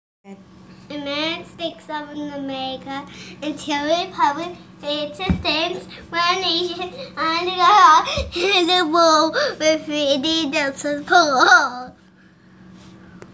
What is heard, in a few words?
Flag song, The Pledge of Allegiance Sung